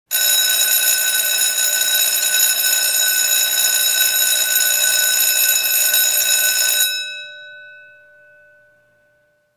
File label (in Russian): zvonok